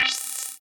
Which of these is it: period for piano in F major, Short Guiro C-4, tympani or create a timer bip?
Short Guiro C-4